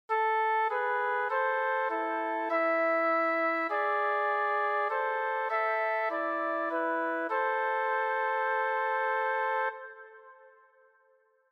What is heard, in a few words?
Harmonic Intervals; y12 sem2 exams